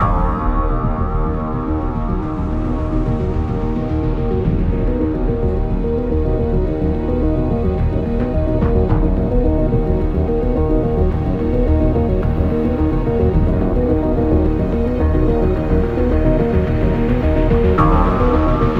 Music Menu

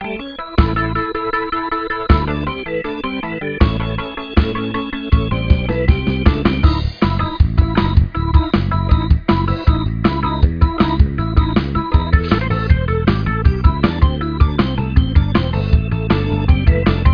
Ride

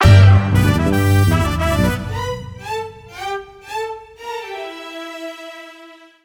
Disco Ball Stinger Attack; Brainstreet Objective3

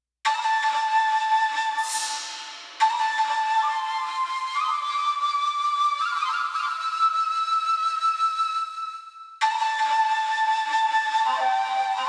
TENSION LOOPABLE

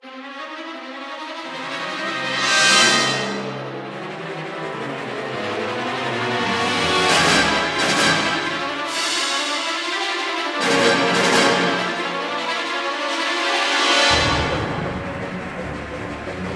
Action

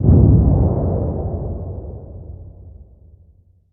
Death Cannon

